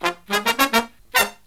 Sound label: Killer Brass Riffs, 108 soul